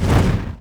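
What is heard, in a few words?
shot whoosh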